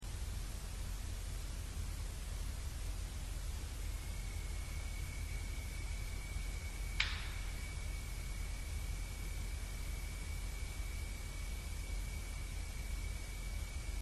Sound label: knock